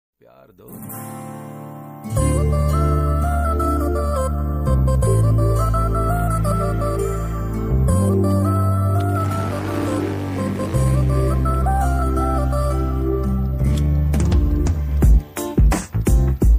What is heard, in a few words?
love bgm ringtone, south ringtone, telugu ringtone, tamil ringtone; mirchi movie